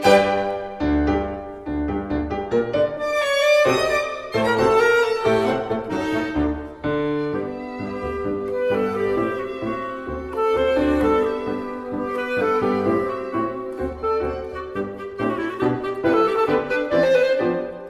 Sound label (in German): Kleine Kaffeehausmusik